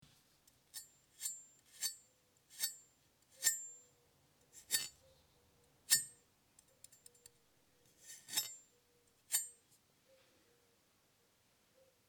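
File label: checking knife sharpness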